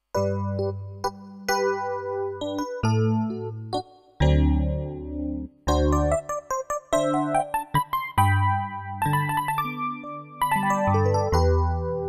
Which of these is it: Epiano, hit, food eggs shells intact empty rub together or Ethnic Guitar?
Epiano